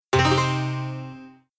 Save Jingle; Dillon's Rolling Western The Last Ranger

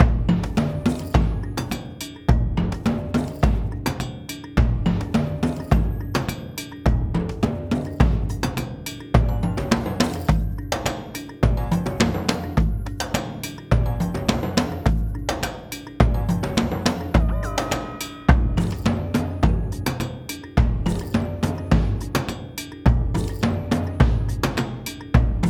jungle bungle

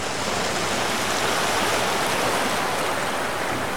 Screech Loop Water

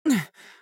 female pain shout

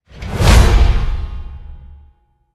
Boss Dwarf Attack; Dwarves

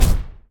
footstep

